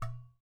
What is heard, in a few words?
GHATAM, DRUMS OF IND